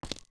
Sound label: Steps Stone; zelda; OOT